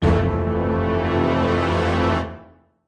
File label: orchestra hit melody kit; PADS and STRINGS